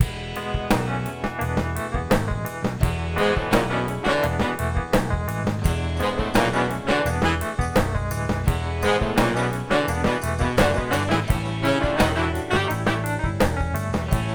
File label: Happy Rock, Music Beds; ADOBE AUDITION (Cool Edit Pro2)